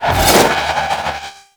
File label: Melee Spin; Warframe; Sentients; Sentient Trooper